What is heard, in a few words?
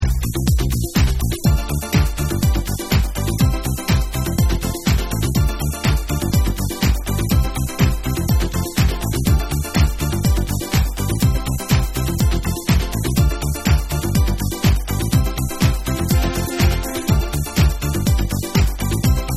Instrumental Beats